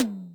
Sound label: Yamaha RM 50 Toms